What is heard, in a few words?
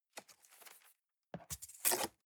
cardboard box close fold